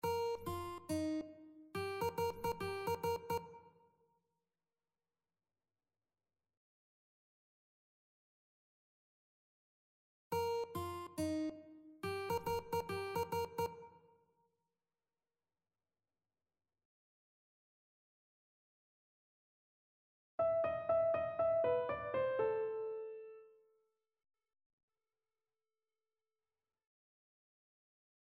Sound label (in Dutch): melodieën